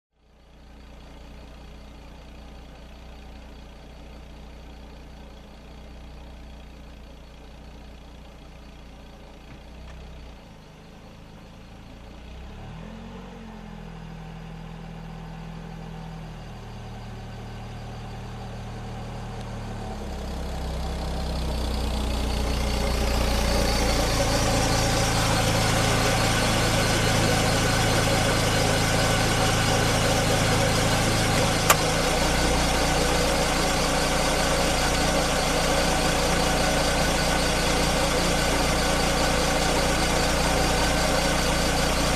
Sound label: Old car approaching and parking